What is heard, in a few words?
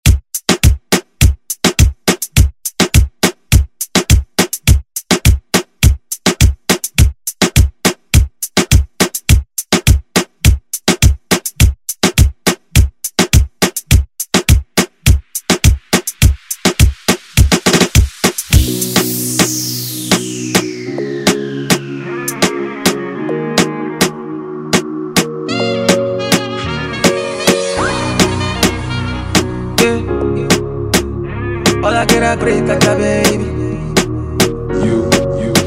Afro Redrum